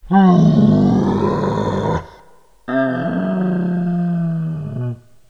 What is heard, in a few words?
monster moans; Voices